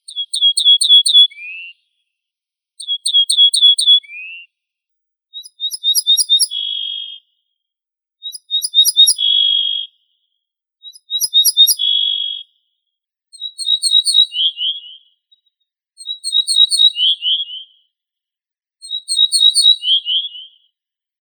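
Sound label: ortolan bunting Emberiza hortulana, nature sounds; ovsyanka sadovaya; zvuki prirodi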